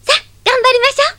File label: gamba